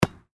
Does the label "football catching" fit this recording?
Yes